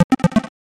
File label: graphics game blip